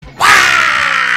waaaaaa

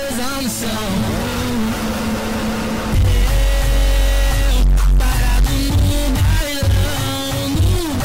bailao bass

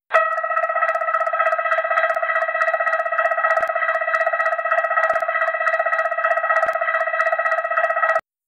fire bell; dc 10

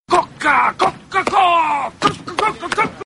chicken; gob

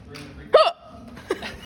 blast

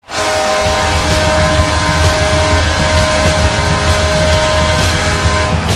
goal horn; pittsburgh penguins 2013

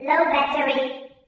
low battery

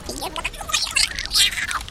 wweeeooow

